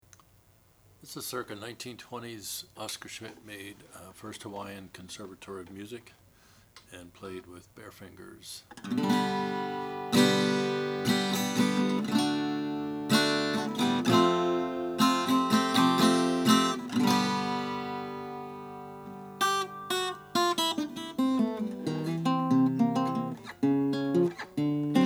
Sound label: oscar schmidt first hawaiian; 1928; conservatory; burgandy